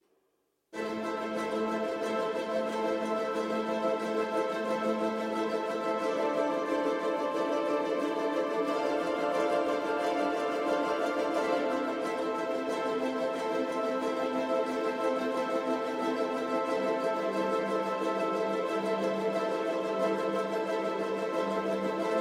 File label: Instrumental